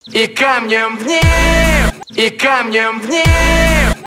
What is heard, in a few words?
greek song